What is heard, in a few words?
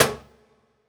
Cajun, Percussion One Shots; Essential Percussion